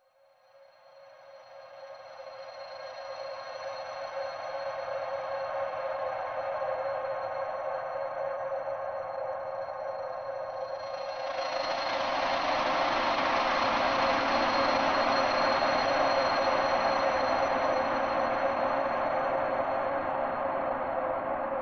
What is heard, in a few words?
Happy Ambience